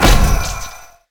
stun; smite; ahpuch skin10

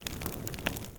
campfire; Smite; Sol

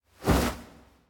phoenix wing soft; smite; npc

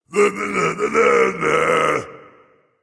tongue, voice; Smite; ymir skin9

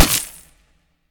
pwr hit; serqet; smite

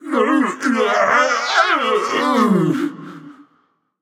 fear; smite; voicepack; thanatos

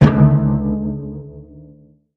defeat lyre powerchord; smite; Apollo

